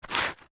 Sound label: industrial sand paper single sand plastic rub